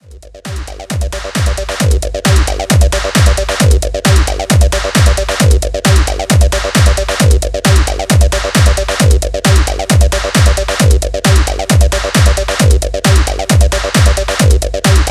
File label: LOCK GROOVE